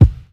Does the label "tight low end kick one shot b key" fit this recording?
Yes